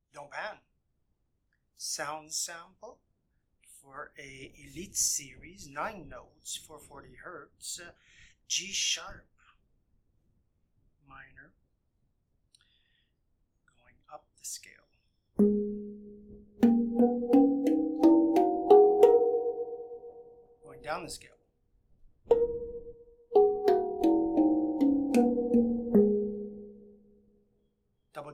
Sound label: Elite 9 notes G wawa Minor 440hz; Domepans